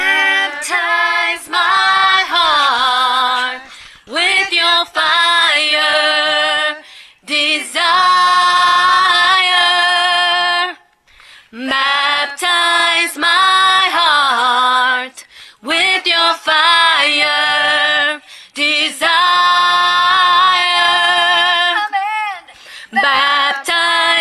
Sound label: baptize alto